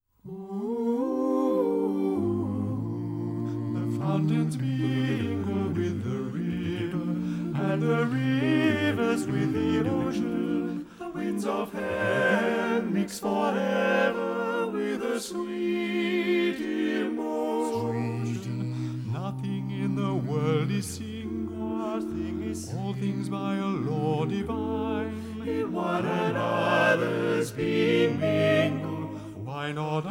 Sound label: for voice & piano; Love's Philosophy; Chanson d Amour